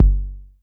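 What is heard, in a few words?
Club bass drum, Jomox Airbase99, Drum Machines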